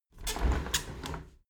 window handle squeak turn open soft; objekte; fenster

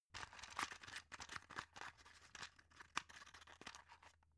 drug open shaky; arzt

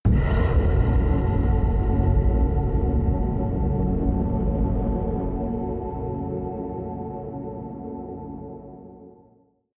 stinger atmospheric scrape, drones; musikelemente

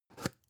spice jar big scratch open fast

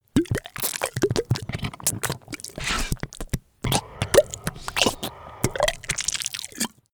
monster flesh eating; comedy-cartoon; soundpool

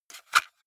cassette case insert medium; objekte; kassette

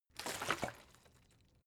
bottle water sparse shake single soft; gastronomie; berufe

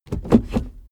suv change reverse gear fast

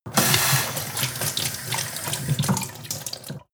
sink water pot medium

